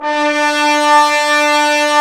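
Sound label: trombones; Roland LA Composer vol. 4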